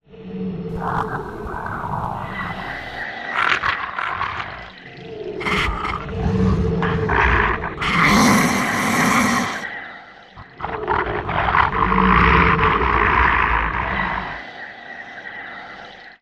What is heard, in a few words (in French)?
peur